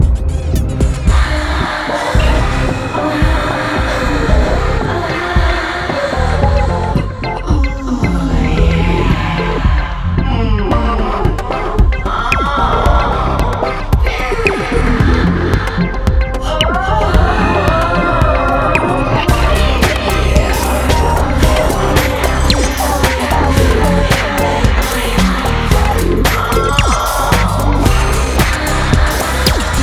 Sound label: Peep Show Loop